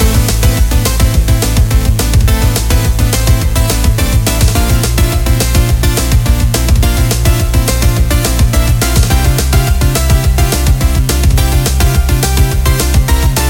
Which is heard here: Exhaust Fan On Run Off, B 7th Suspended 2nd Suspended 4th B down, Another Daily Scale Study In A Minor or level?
level